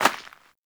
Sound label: Rodent Hop Step Rear Dirt, Footsteps; Warframe; Characters